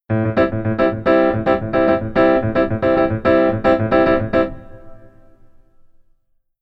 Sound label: Keyboard